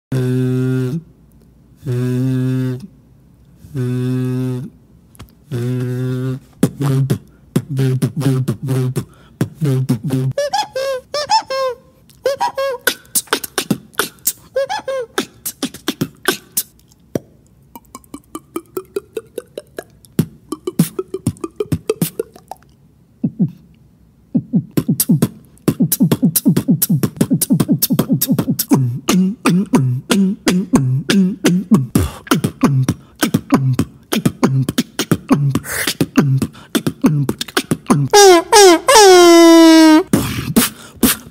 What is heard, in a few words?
Beatboy box